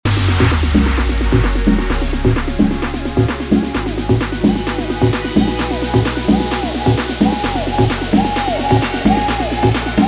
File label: intro outro 130 bpm; bootleg